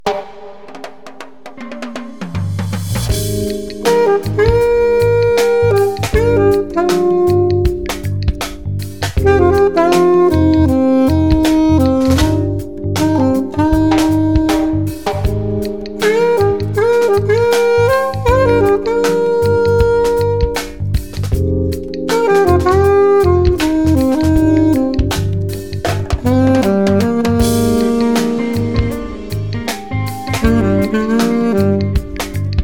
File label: Saxophonist Chill Out